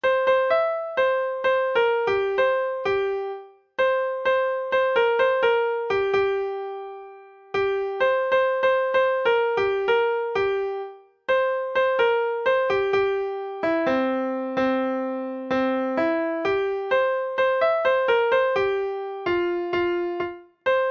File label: TONEBELL